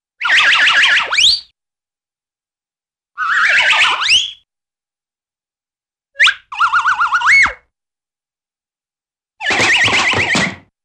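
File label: Circular Motion Whistle with streak drum, Cartoon; Hollywood Edge; Cartoon Trax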